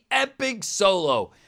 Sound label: epic solo; torchlight